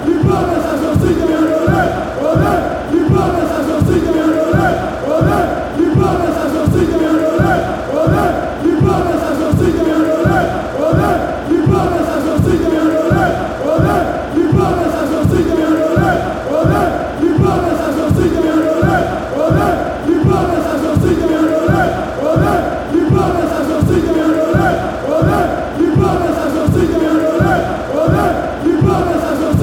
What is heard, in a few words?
chant, fanchants